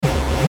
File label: Glitch, Glitch Electric Warble; Production Element Imaging Element Accent Transition